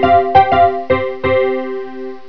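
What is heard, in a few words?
honky tonk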